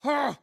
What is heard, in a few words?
AHurt